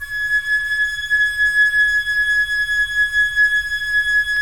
FLT FL, Flute; Roland - LA Composer vol. 4

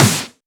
Snares; Vengeance - Essential Clubsounds Vol.4

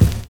DRUMMER

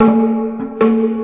PERC LOOP, Asian Traditions; USB Soundscan vol.60; AKAI

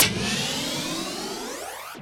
sfx sci fi; Masterbits - Switch FX

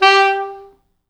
G3, Pop Brass; Best Service ProSamples vol.19; AKAI

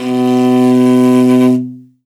L, morin khuur, World Instruments

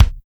BASIC KICK; NATUR. K1

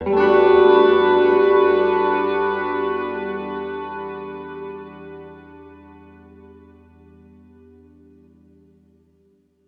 Guitar Chords E; Zero-G - Future Wave

